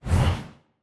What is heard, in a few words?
Screen Wipe In; A Dance of Fire and Ice